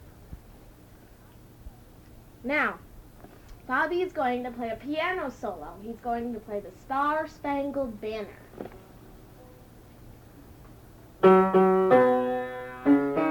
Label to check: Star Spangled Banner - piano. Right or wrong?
Right